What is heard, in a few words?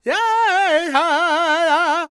NA G# MIN, Vocal Planet World Voices LAKOTA; ILIO; Vocal Planet VOL-5